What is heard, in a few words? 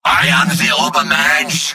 cheers; medic; video games; source engine; tf; mvm